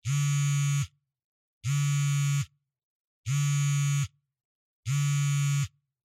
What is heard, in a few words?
Cell Phone Vibration Cushion